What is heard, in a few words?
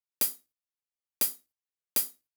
chh inner; hydrogen